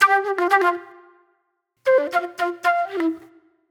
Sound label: Flute, Disco